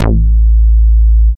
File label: BASS; X-Static Goldmine 1